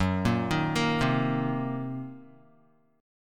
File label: Gb 6th Flat 5th Gb down Guitar; arpeggiated; Gb6b5; JGuitar